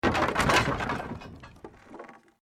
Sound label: Wood Collapse; Created dramatic effects of buildings collapsing or breaking; Materials